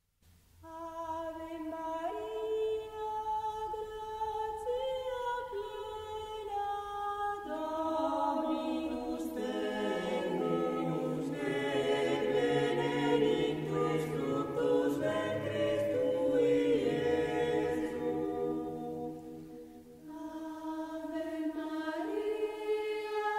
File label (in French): Très belle interprétation, on devine les 4 voix